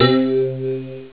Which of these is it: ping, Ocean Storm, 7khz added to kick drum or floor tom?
ping